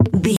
footstep wood wobble

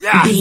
voice male attack

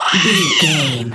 goblin fairy battle shout

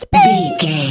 voice fun character vocal crazy

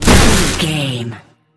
electric lightning blast

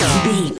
sci-fi weapon blaster laser fun

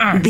voice male hurt pain low

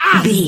voice male hurt pain

